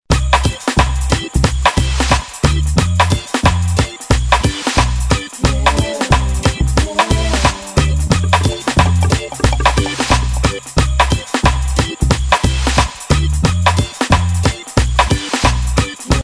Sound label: Reggae, ringtones; Alcatel OT-C55x-PC-Suite